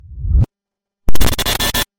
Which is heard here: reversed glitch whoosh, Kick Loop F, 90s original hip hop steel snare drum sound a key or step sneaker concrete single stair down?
reversed glitch whoosh